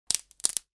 wood cracking

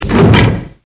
jail door close

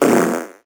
bubble wet

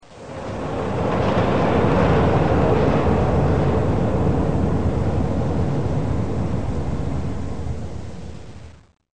Train

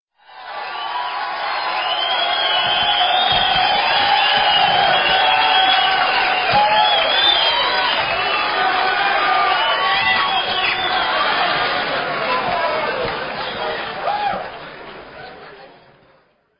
Applause

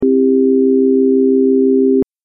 Just intonation do mi so

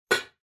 pot lid close

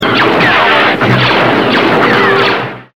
BLAST

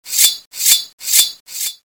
swords

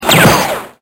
BLASTER

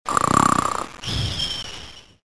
snoring

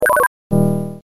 beep

